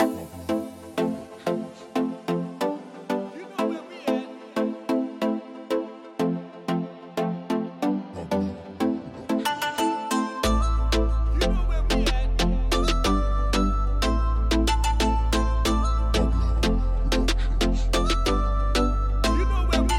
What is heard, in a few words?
Reggae Dancehall